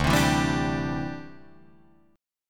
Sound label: D 7th Suspended 2nd Suspended 4th D, Guitar Drop D